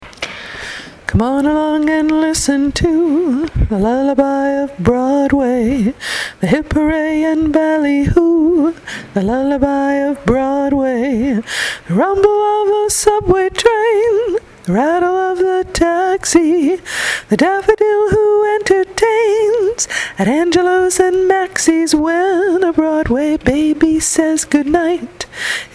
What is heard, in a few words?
vocal